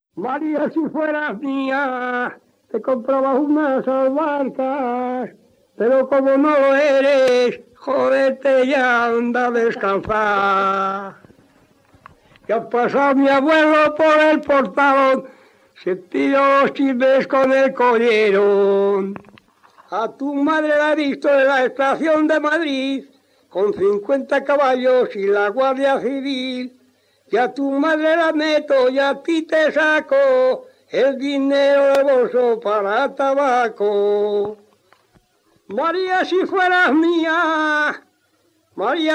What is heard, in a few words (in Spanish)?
Jota, Villabragima; Jota "Y al pasar mi abuelo por el portalón"